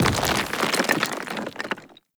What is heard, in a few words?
Tempest Tesla Cannon Impact Surface; Weapon Impacts; Player